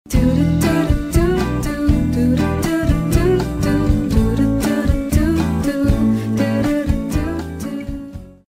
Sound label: sms